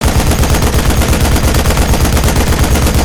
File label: gunstand machinegun fire loop, turret; gow; turret judgement